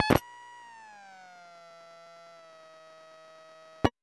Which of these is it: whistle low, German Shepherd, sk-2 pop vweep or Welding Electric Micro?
sk-2 pop vweep